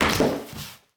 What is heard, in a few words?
Bodyfall Tile; SFX Kit; Bodyfalls